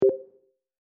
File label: cyber cursor